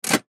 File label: Soda Vending, Soda Vending Machine Antique Turn Handle Crank; Soda Vending Machine Antique Turn Handle Crank Fast; Machinery; Blastwave